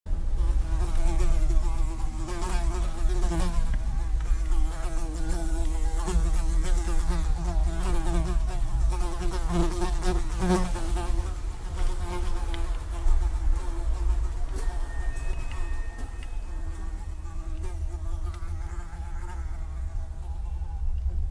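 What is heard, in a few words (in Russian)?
zvuk zhuzhzhaschej muhi, buzzing fly sound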